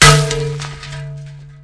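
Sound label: grass; Mafie 1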